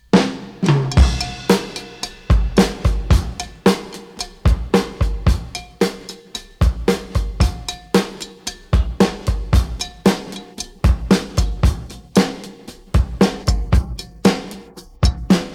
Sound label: pattern, drums